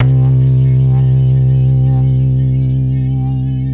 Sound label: Syn Bass C3